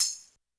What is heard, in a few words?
Tamborine; YAMAHA RM1X